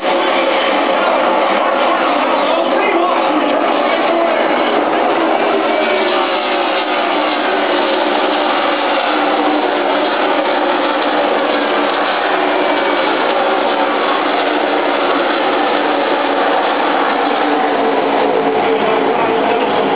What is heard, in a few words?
GOOD NASCAR SOUND